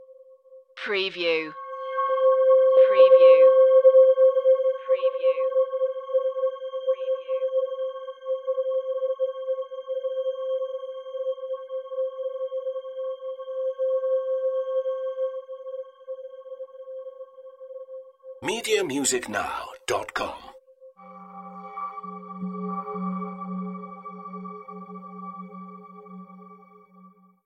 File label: ghost transition